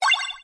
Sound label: coin collect noise; star-wars-rebels-team-tactis